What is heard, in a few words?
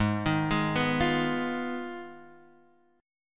G sharp Minor 6th G sharp down Guitar Half Step Down